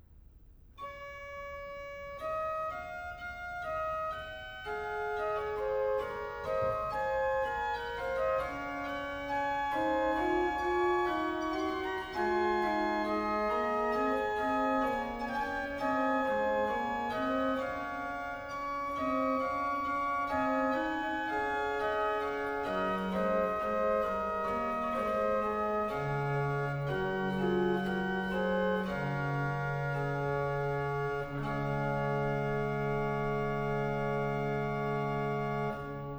Organ Improvisation; Verse 1 Point ('Tallis')

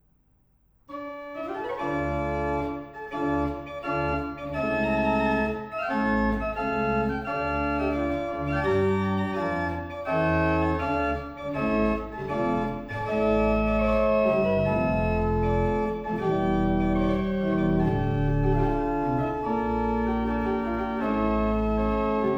Organ Concerto; A. Ouverture

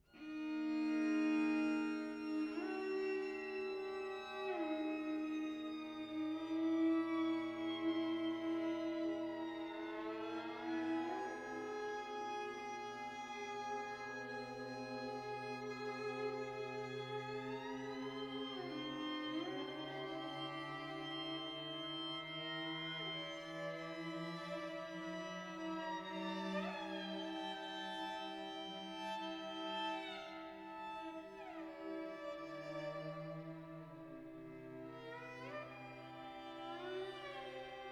Slide piece 3 (F MINOR)

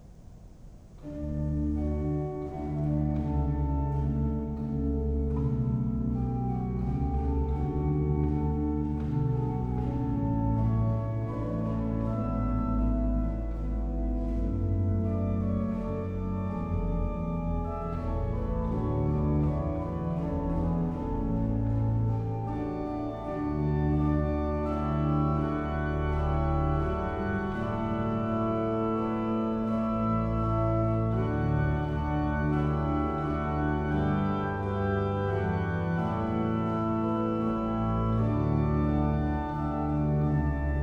Improvisat, Organ; Organ Music from Bohemia; Am Ölberg in nächtlicher Stille

